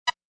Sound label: Beep